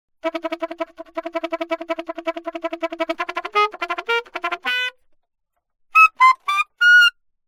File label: altosax